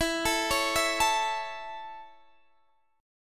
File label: C sharp Minor Sharp 5th, guitar chord